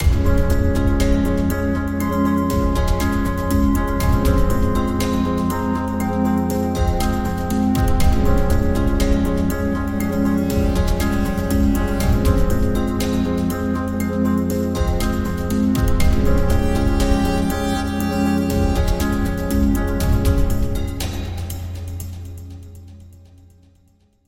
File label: Smooth Epic